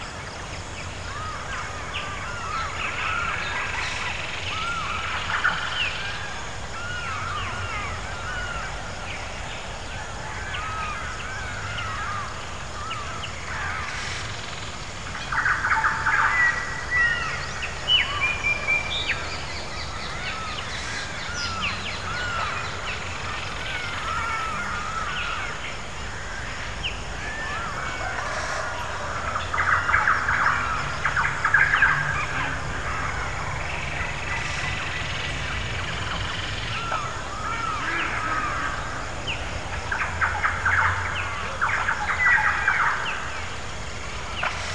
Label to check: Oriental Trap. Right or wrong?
Wrong